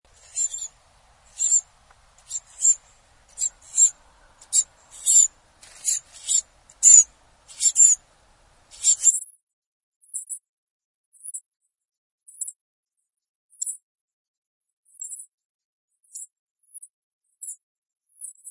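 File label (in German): Zaunkoenig Kueken Ruf, Vögel in Europa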